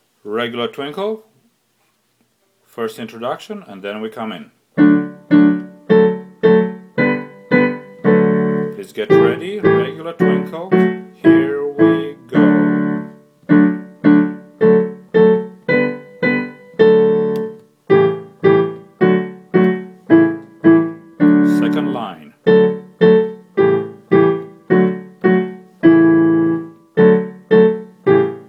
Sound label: regular twinkle, strings